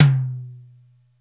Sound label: SY77 TOM, drum; Crypton - DrumBase5000; SY77 DRUMS